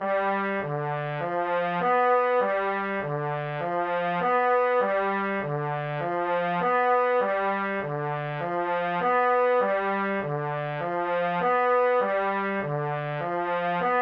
Horns G

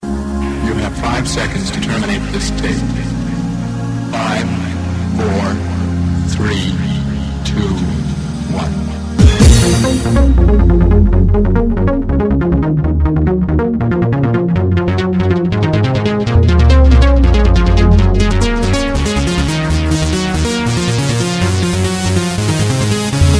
techno rave

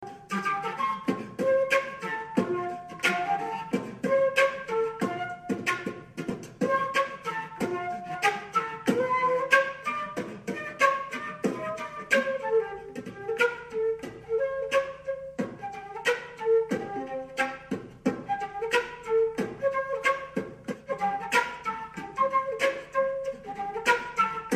Flute Beatbox

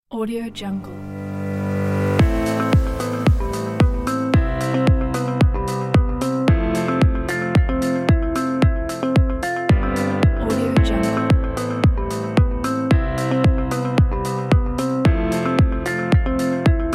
Ambient Music

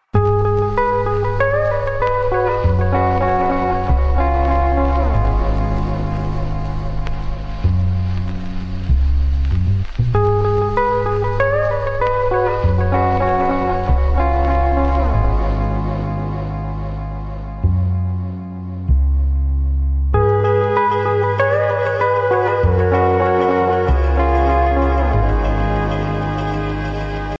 iphone original tone; ringtones; sms

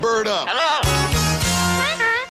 Bird Up Build Up

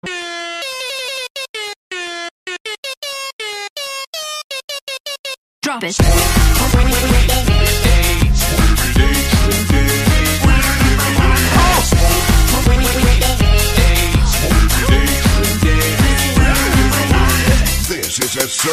Horns

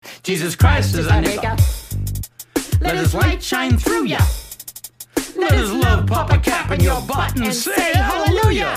Rap

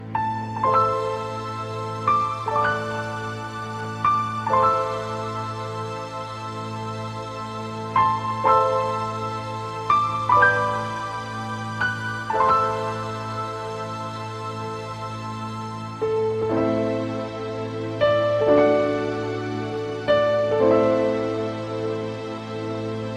theme song, ringtones, games

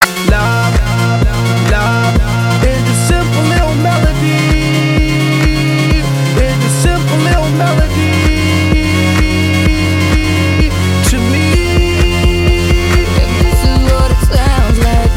simple melody

